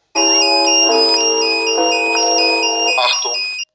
feuerwehr alarm

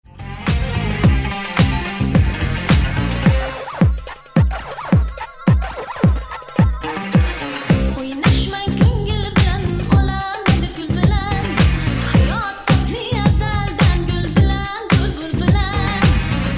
Arabische Frau Mix

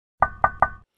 Klopf Klopf

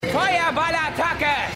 Feuerball Attacke

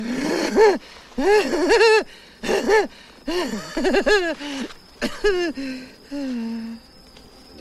Irre lachen

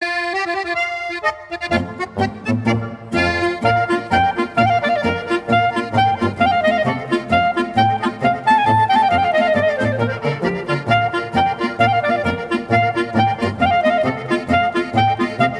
klarinetten polka

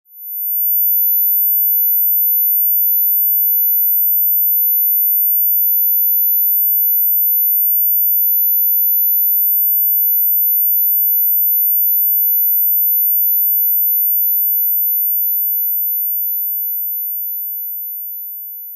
Piep

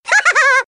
Worms Lachen

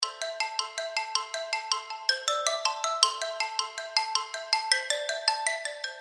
Xylophon

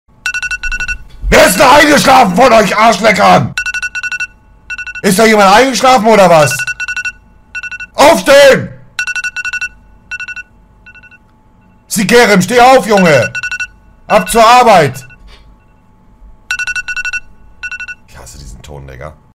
Wecker

